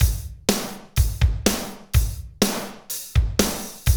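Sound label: medium tekno, Roland drum beats; Northstar - Drumscapes Roland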